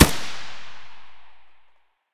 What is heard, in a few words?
ak74u muzzle mono distant, weapons; STALKER; IWP